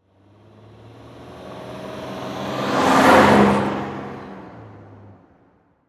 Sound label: Truck Ford Ranger Drive By Fast With Wind Noise; transportation